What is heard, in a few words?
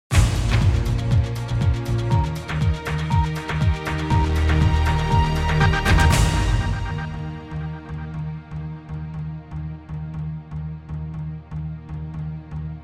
News Sting; World Breaking News